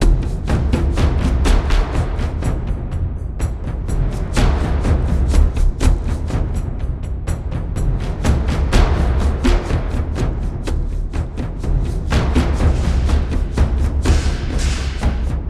Caves Drums Loop

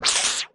aggro

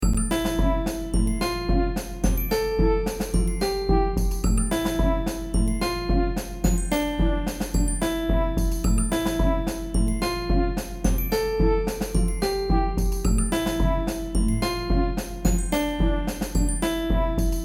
Orchestra

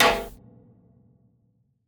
Attack Impact; portal quest android; Shadow Minion3

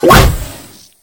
Monster Crash; Doodle Jump 2; android

